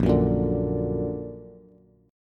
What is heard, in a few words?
Bb Augmented Bb, Bass; strummed; JGuitar